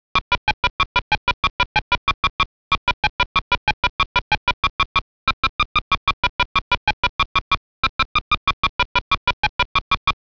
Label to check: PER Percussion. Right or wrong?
Wrong